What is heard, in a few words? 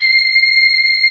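ensemble strings C7; Star Trek JR Audio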